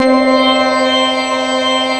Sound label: ATMO SYNTH; AKAI S6000 CD-ROM - Volume 1; SAMPLE WAVE MIXING 2; GALAXY